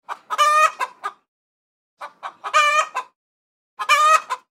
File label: chicken laying egg vocalisations x 3